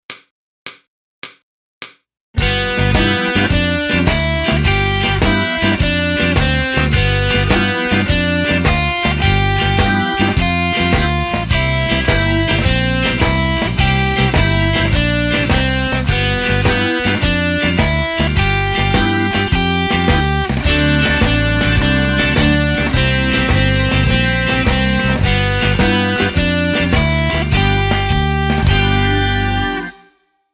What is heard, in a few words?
blues